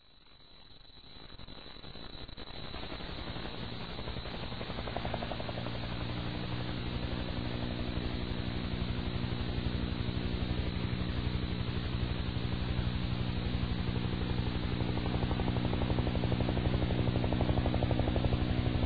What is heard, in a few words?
flyby